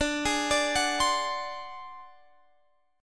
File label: G sharp 7th Flat 5th D, chord